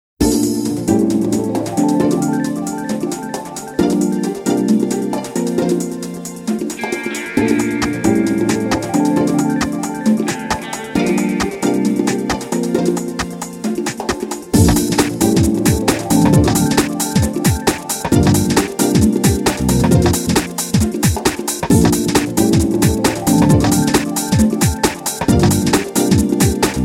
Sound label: Instrumental